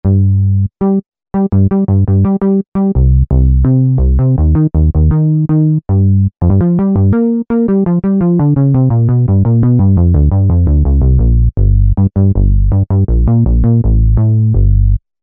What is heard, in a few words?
3 Saw MG Bs, Roland V-Synth; Factory Patches